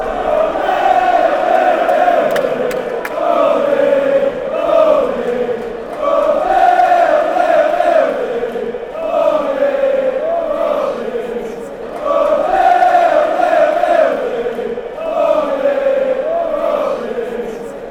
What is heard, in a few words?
ole ole; leeds